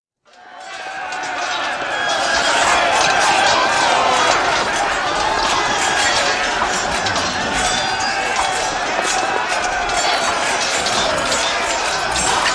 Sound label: Battle with bows and horses